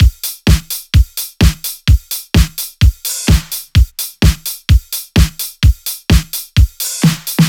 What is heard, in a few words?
128BPM Latin Kit; Latin E Kit